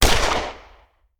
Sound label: Hand Gun; Sound Ideas; Guns